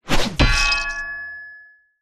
warfare weapon heavy axe swing hit body against wood clang impact squench; zapsplat